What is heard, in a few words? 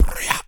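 vox percussion, Vocal Planet Jazz FX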